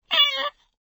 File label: dinosaur cry; SoundsCrate